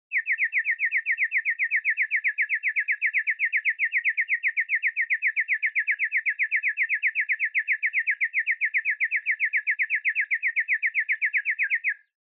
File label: Motion Dizzy Fast Long